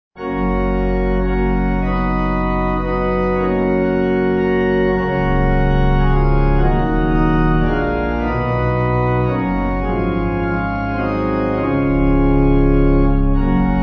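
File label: Pipe, Pipe Organ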